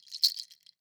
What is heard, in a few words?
Kenari Seed Wrist Shaker; World Percussion; Cymatics; Emperor World Percussion